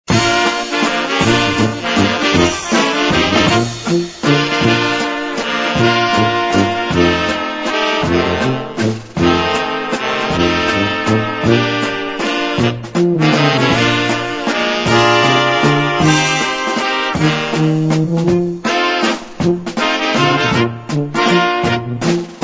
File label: LAENDLER